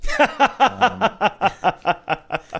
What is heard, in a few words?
awesome laugh